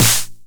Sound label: 909 SD Tape Sat